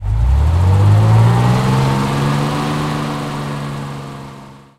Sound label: valentine engine load